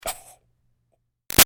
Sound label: Steam Release Iron Puff; Miscellaneous Household; BigRoomSound